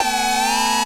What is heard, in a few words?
rave siren